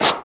DRM OLD, dance synth

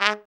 TRUMP; Trumpet

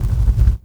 bass, 150 BPM; Ueberschall; Drum & Bass Resonance

